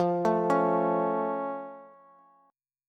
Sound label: F Augmented, Bass; arpeggiated; down